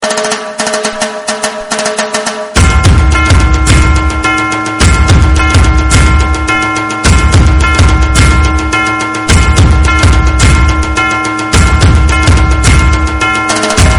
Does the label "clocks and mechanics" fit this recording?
No